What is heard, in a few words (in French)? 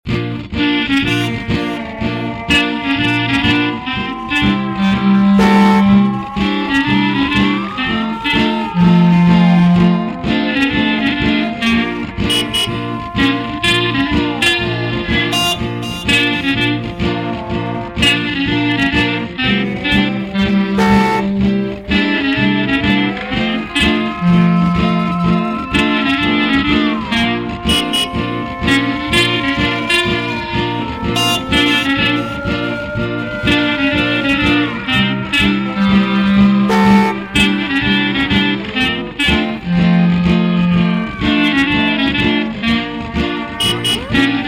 clarinette